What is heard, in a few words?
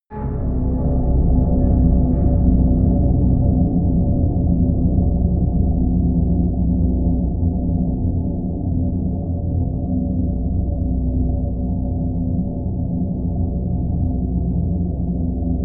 drone hidden